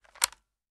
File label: weap selector on; weapons; gmod; viper; scharlie